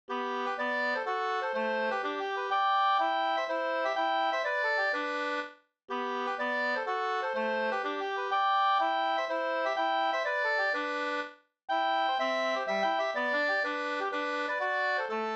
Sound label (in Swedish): polska; e Pehr Ericsson Leffler